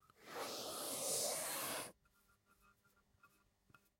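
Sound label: slow suction into the syringe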